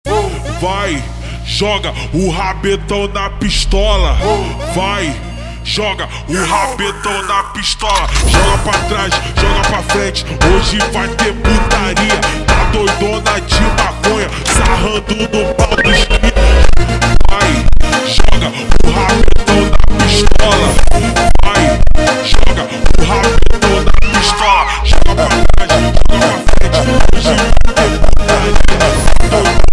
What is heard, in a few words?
Montagem slowed